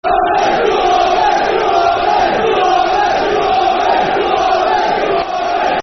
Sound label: Blue Army Football Chants (Soccer Songs), Cardiff City; fanchants-com